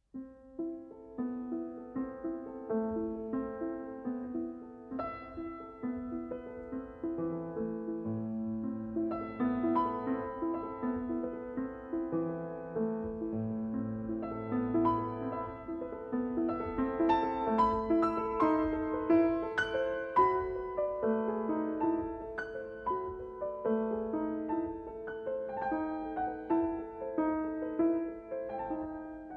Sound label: Etudes tableaux, For Piano; Op. 39 No. 2 in A Minor